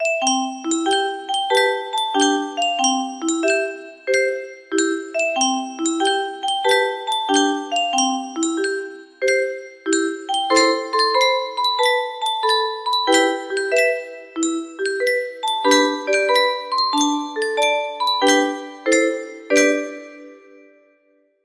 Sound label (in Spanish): himno, music box